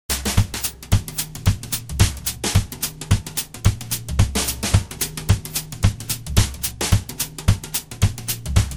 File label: loop dark groove hip; WMBonusXP